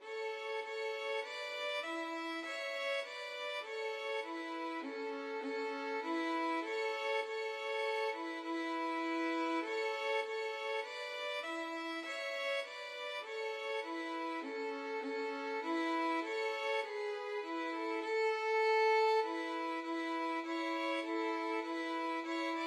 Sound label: beethoven ode to joy violin duet